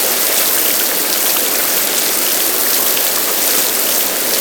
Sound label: emitter water spray